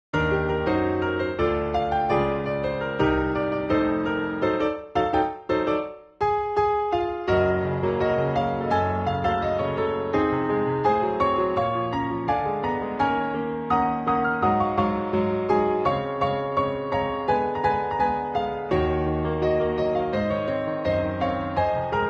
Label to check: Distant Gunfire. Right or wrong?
Wrong